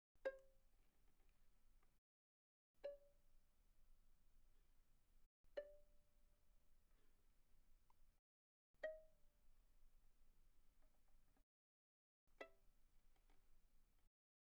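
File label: Violin pizzicato pianissimo sul D C5 Db6; Strings; violin2012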